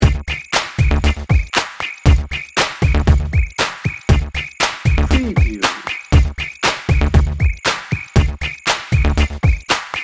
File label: jingle bells dnb